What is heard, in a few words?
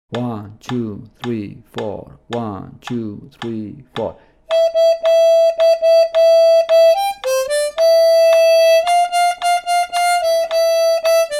Jingle Bells 110 bpm, harmonica